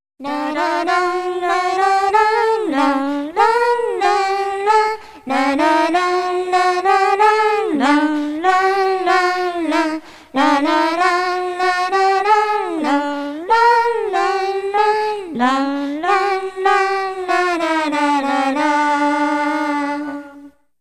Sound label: ending song boy girl